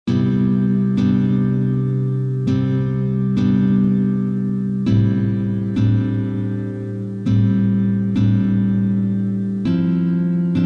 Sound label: solo guitar